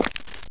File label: step; kingpin; steps